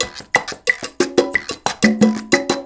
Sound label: 180 Latin Pt; Roland BK-5; Loops & Phrases